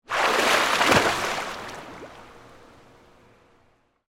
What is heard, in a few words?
Pool Indoor Surface, Water, Splash; Pools; BlastWave